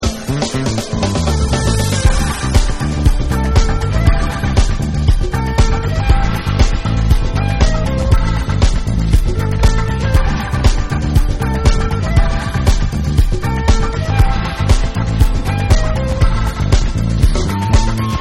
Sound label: Deep Orchestra Remix